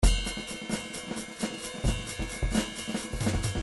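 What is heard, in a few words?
drums jazz pattern; Paul Kodish Dangerous Drums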